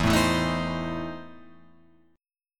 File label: E Major 7th Suspended 4th Sharp 5th E, Guitar, Open F; strummed; JGuitar